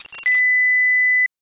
dialogue death

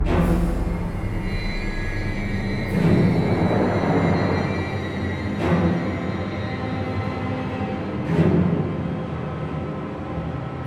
Music Tension

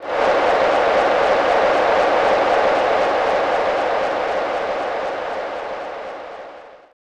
Excited Crowd; snes; super bases loaded 3

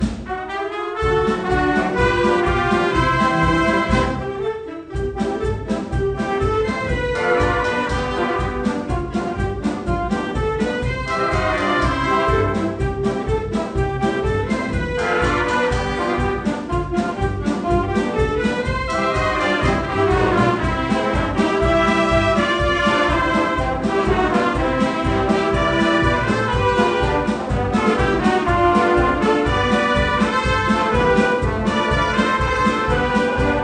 Menu Song; NCAA March Madness 98; PS1

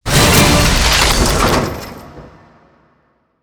Interface Item Reveal Legendary; Counter-Strike Global Offensive; PS3, Windows, Xbox 360 gamerip 2012

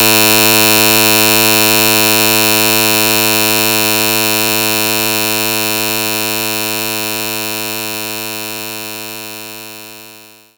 Filter Snap

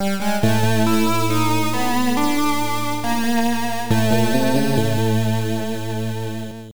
Defeat; Tengai Makyou Fuun Kabukiden; PC Engine Super CD-ROM2

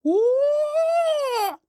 attack

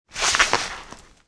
FENCING; Five Nights at Freddy's; FNAF 2

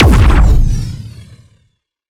Sciweap Shot Large Blaster Plasma Cannon

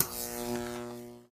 magnet shroom; Plants vs. Zombies

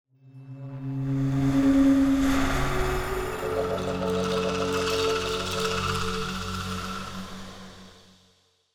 Hud New village build; From Dust; ps3; xbox 360

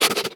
breath; Scrat

